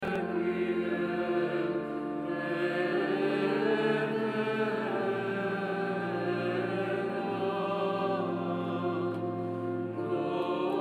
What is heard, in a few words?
Requiem